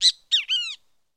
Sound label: Kiskadee Bird Call